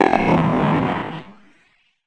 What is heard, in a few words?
CRUNCH ROAR; Zelda; Sample Libraire : (Rarefaction) A Poke in The Ear With a Sharp Stick II